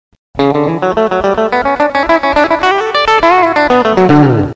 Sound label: d scale, guitars